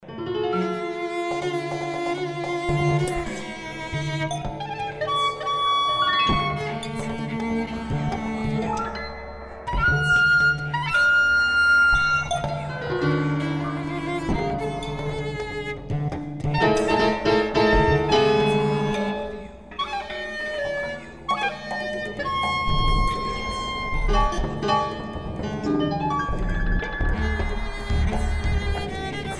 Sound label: Round Dance; ronmaz